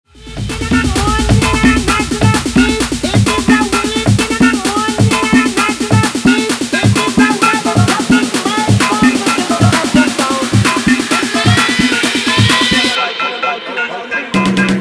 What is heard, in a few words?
guaracha remix 130 bpm